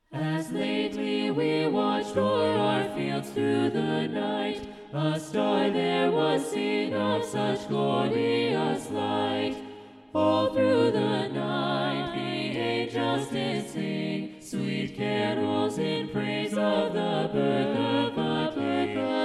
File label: SATB Childrens Choir predominant, choirs